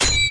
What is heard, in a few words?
clicky things, Clicky Gadget Things; UNUSUAL KITS and FX